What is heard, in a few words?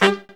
Brass Stab; CMI